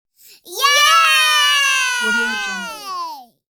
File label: two preschool boys shouting yay